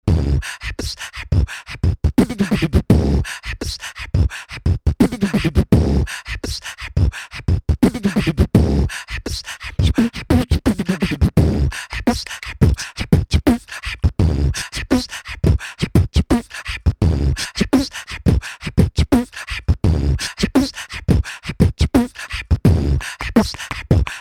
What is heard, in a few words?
Beat Box Sunny Day